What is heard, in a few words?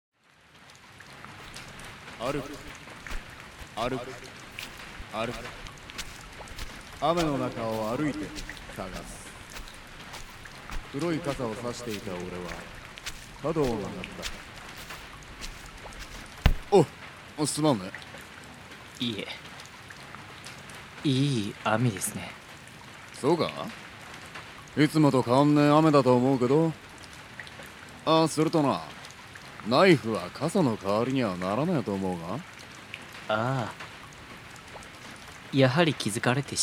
rain drama